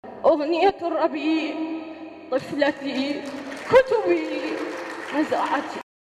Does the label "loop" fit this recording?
Yes